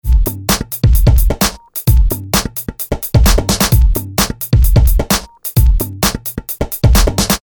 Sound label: original loop